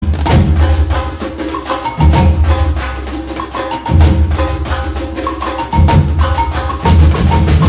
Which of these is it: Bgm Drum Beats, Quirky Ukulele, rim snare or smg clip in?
Bgm Drum Beats